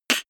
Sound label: beatbox snare clap damped